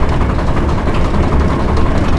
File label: tram gears turning